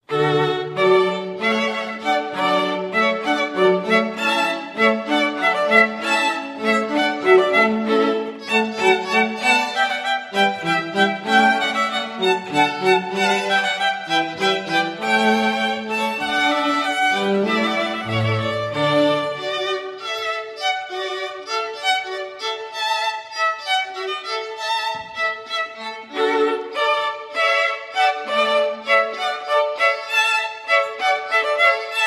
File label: Hornpipe